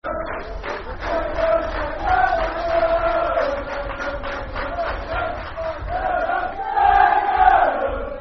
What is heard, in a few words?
Football Chant (Soccer Songs); Dagenham; Dagenham & Redbridge Drfc